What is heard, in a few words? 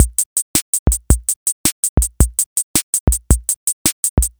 Slow Rock